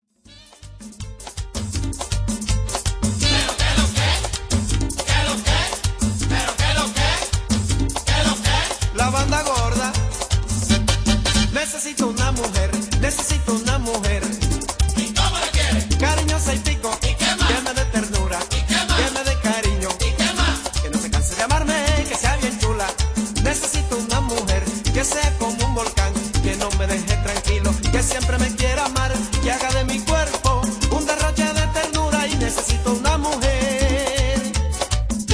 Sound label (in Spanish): merengue